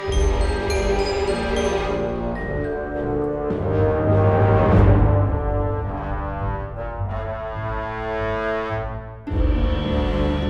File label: Halloween Main Theme - Ambient; Warface